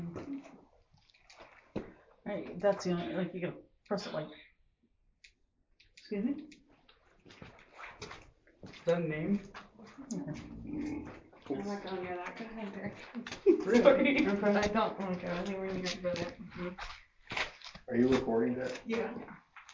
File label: Basement EVP